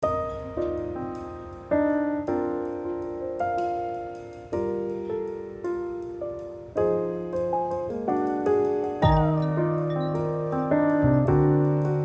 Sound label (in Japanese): Lo-Fi カフェ LOOP ～ピアノジャズ風作業用 Chill まったり落ち着く～; にゃるぱかBGM工房; drums